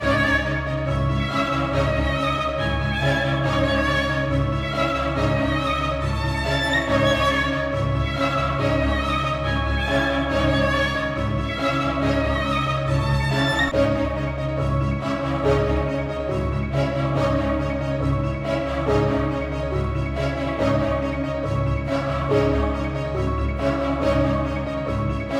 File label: 140 BPM C# Min; Melody; Spartans; Cymatics; Cymatics - 2022 Melody USB Expansion